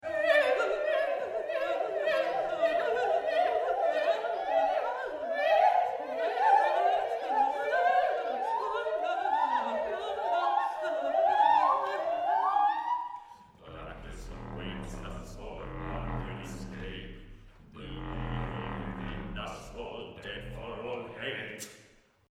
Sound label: death tongue